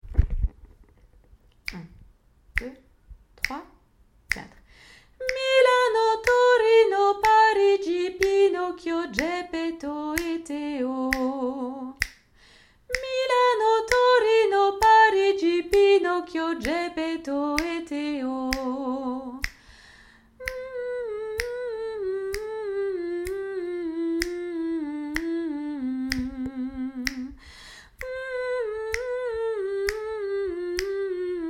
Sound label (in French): vocalise